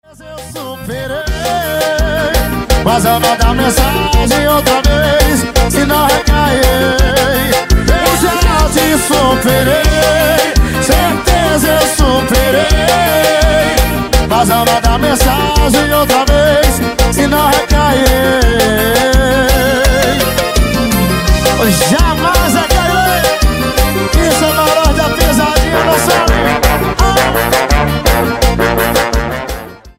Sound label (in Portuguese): toque ringtone